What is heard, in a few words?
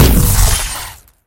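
triumphant laser strike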